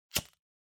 Lighter Flick

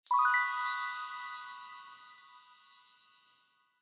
Multi Accent High

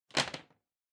Vacuum Cleaner Cable Noise; 掃除機; ケーブル ノイズ

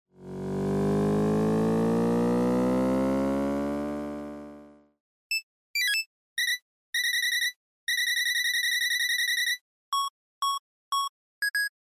NES Racing

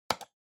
PC Mouse R Hard

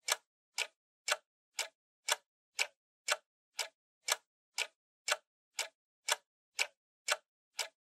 Clock Second Hand Dry Loop

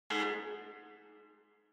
Electromagnetic Buzzer Hall

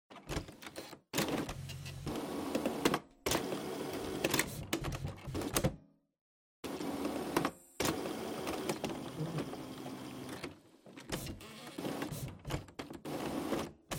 Printer Cleaning

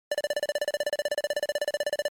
NES Action Score

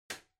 Light Switch Rocker, Far Dry Hard

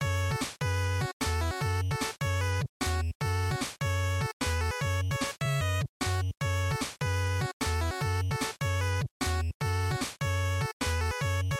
Game Loop; bgm; GB Sports

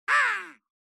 Crow Synthetic

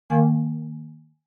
OS Sound Notification

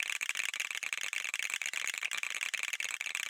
Motion Tremble Loop

